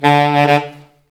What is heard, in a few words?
SAX A. STAB, Alto Section; Roland L-CD702